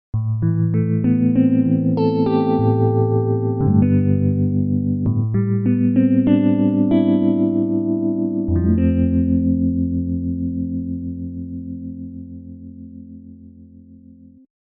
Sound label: Guitar FM